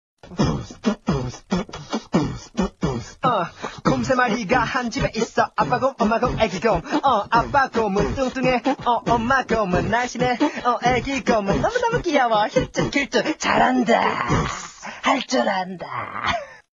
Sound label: Rap